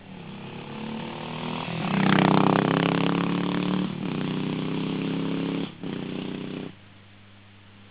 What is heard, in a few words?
ducati 350, cycles